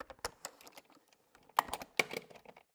Cabin, window, latch, flip, lock, push, loosen, clatter, wide; Eiravaein Works; Vaeyan IV